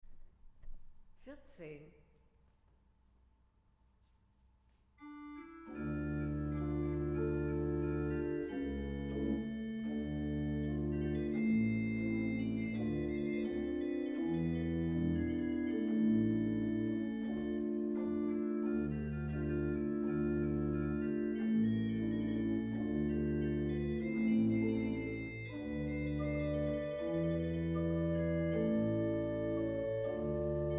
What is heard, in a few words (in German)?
Weigle, gebrauchtorgel